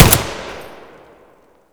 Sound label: pkm; weapons; gmod